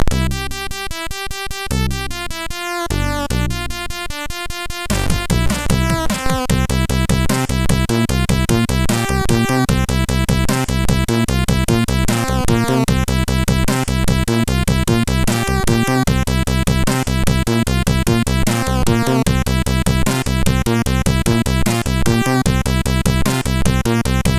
Folk, MOS6581R4

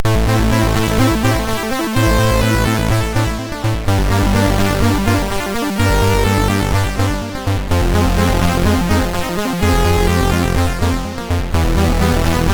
echo, MOS6581R3

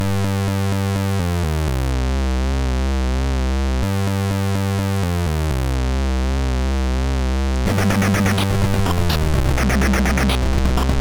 sid, MOS6581R2; Plutonium

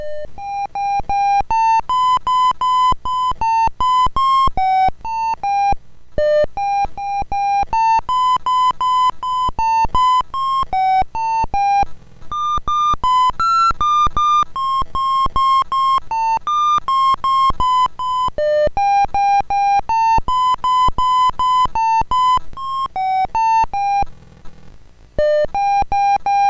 Christmas Tree, sid MOS6581R2

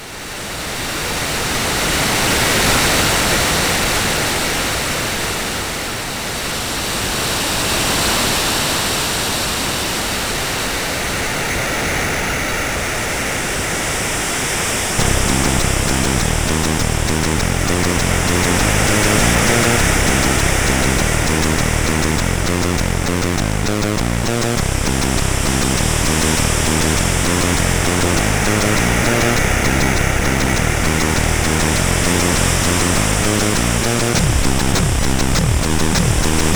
Sea Music, MOS6581R4

